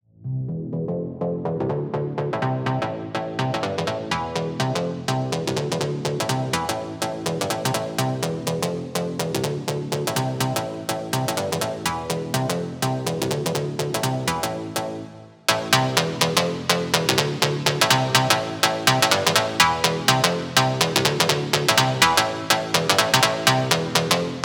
Bass WET, 124 BPM A; Future House; Big EDM; Future House Tools; Construction Kits; Cracker

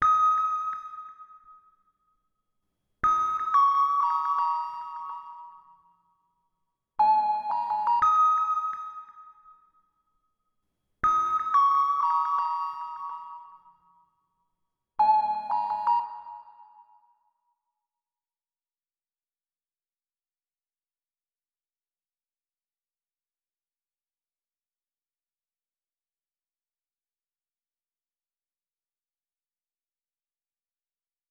120 BPM F Min Bells; Cymatics; Destiny Gold Expansion; Blue Magic

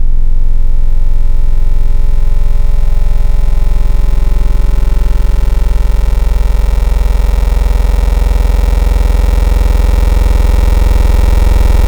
FM Table, FM Wavetables; Cymatics; SERUM

